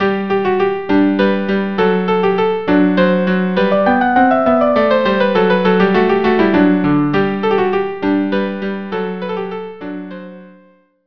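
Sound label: violin cello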